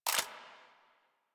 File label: Gun Move Foley; Ambience; Frumentarius; Warframe; NineteenNinetyNine